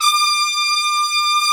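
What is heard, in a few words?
BRS TPTS, brass trumpets section f; Roland L-CDX-03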